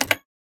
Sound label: button Open; hotline2